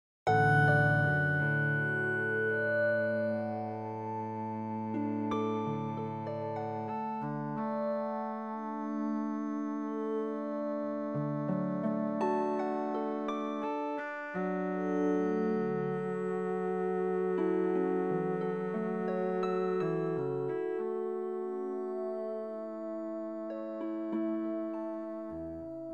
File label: canon chords; Pachelbel